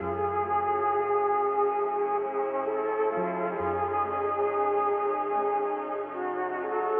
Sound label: flute, Loops, Sad